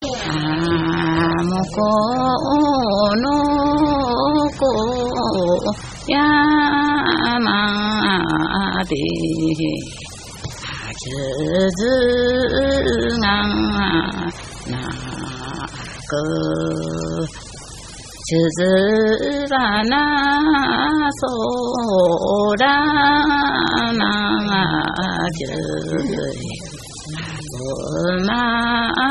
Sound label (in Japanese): taueuta, minyou